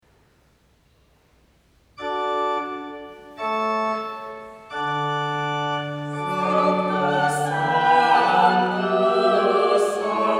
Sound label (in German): Missa in D-Dur Sanctus